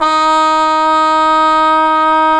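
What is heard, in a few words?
brass cup mute trumpet, dry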